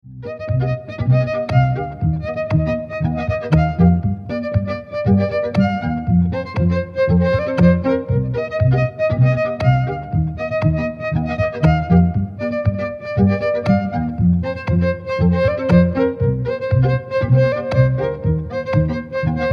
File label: Solo Electric Violin